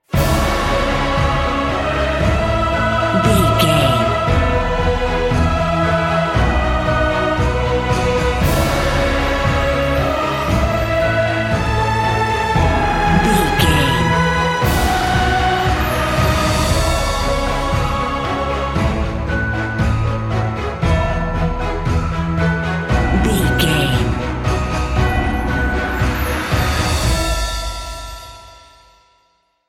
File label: cinematic corporate